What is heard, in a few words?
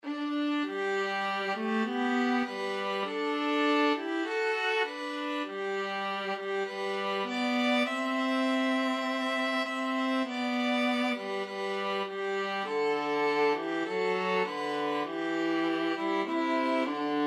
violin viola duet